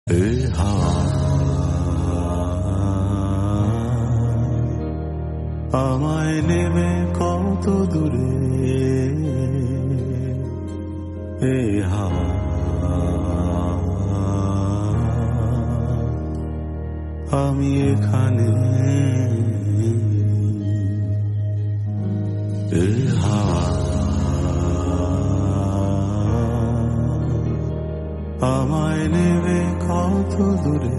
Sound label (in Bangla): arkestra bengali